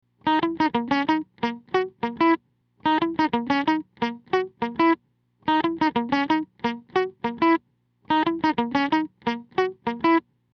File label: clean bridge